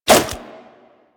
Nunchuck End Whoosh H, Infested, Weapons; Warframe

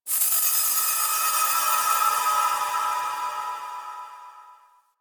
Moon Texture Small, Ambience; Warframe; Orokin

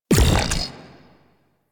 Fan Energy Trench Projectile Burst; Warframe; Warframes; Protea

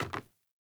Sandals Land Wood, Footsteps; Characters; Player

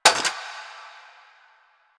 Cafe Chair Short

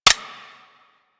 Dex Furis Mech B; Tenno

